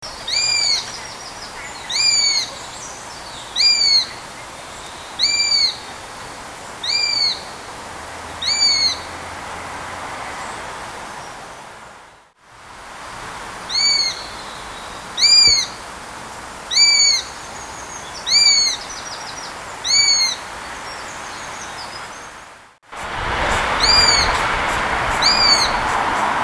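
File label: perepelyatnik golos, sparrowhawk voice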